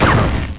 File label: slimeball; Cube